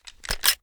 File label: first raise open slide, reloads; gmod